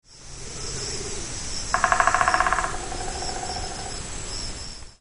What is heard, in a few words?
nogutigera drumming; yanbaru